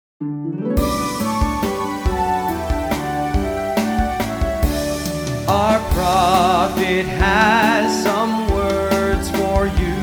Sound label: vocal